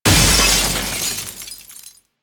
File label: Cargo Train Glassbreak Shatter B; Warframe; Corpus Railjack